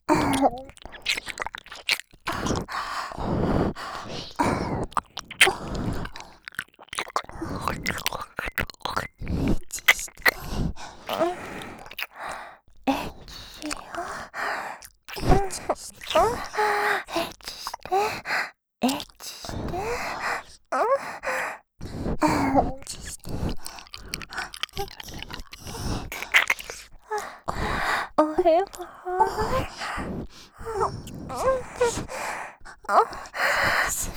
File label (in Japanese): 耳舐め 喘ぎ; エッチしよ♪; ロッテ